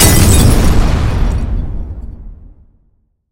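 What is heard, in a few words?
deadly fire after explosion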